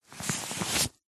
foley clothing high vis jacket thick hand remove from pocket